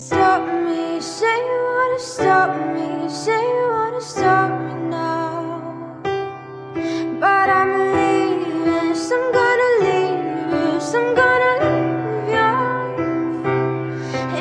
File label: Piano Girl